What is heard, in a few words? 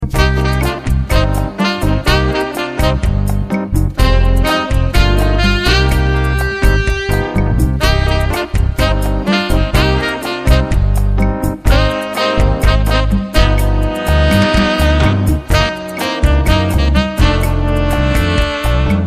brazilian jazz